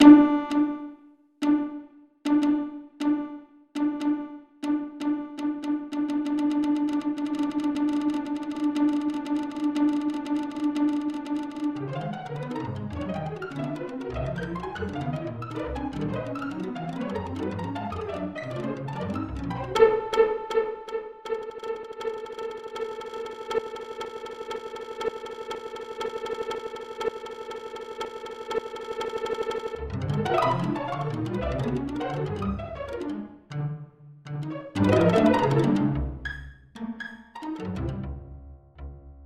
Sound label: String Quartet; Variazioni Piccoli